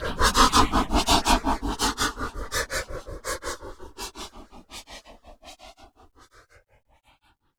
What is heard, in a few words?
Industrial; Sonic Foundry (Sony Creative Software); Underground UK House & Electro